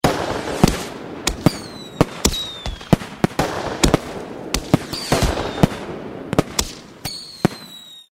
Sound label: fireworks loop; Fluffy Arcade: Doubleup Ducks LuckyTap; Eyecon